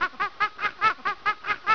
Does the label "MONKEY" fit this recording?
Yes